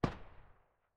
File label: ump45 silencer mono far